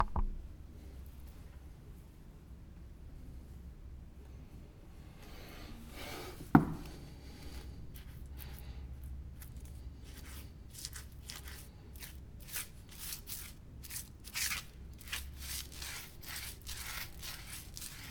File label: Painting